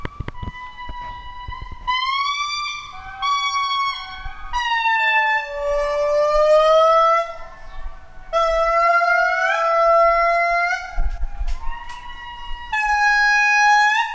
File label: Indri Sound, Andasibe